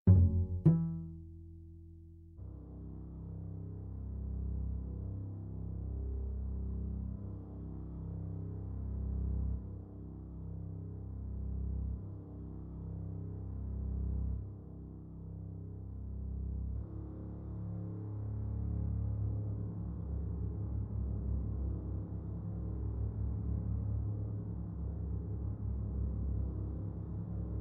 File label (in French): Duo contrebasse; contrebasse 5 cordes; ctb 5 cordes